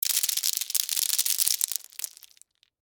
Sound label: Debris Lima Beans; Texture; Materials